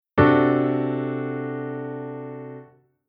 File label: Chord Hybrid